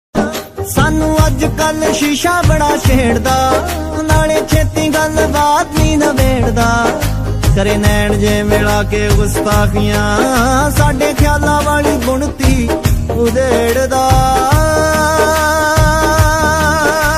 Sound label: Tik Tok Song Ringtone